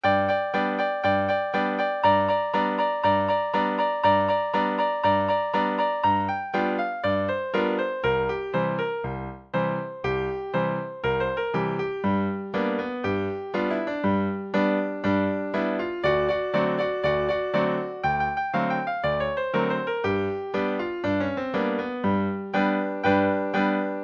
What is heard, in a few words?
bluegrass twist